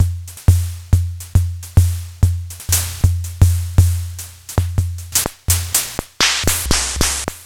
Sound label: Austin ARB-6